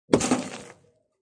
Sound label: Snowball explodes and shatters against brick house; Snowball Hits Brick House; Hail (Sound Effects:Weather)